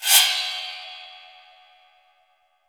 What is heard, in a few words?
steel; AKAI S-Series CD-ROM Sound Library VOL-5